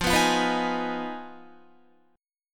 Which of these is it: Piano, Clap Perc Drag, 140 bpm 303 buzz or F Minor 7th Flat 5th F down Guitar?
F Minor 7th Flat 5th F down Guitar